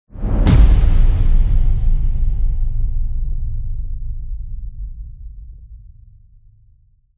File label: CINEMATIC HIT Heavy Drums Short; smartsound